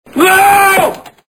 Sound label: ohhh